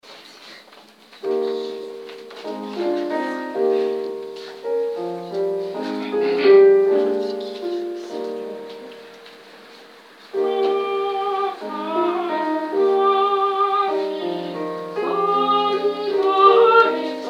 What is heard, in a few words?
o salutaris soprano piano